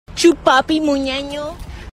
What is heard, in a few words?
aahhhh; chupapi